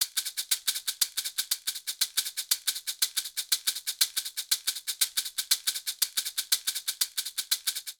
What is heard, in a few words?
Mozambique Maracas 120bpm; Latin Percussion; Ableton; Factory Packs